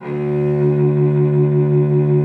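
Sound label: D2, cello vibrato; Xsample VOL-7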